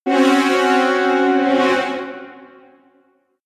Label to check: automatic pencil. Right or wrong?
Wrong